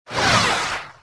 saber hit wall; weapons

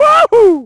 wahoo; Splashdown; XBOX

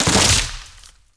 tree snap; impacts explos

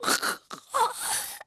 DEATH, VOICE; Pulse Racer

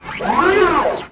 servomove; weapons; galak

